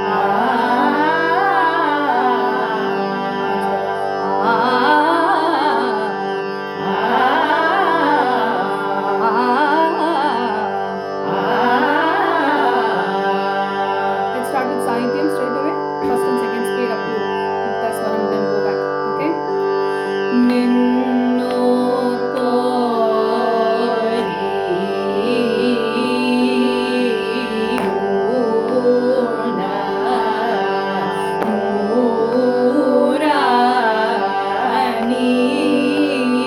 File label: Carnatic Adults